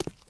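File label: boot wood walk right, footsteps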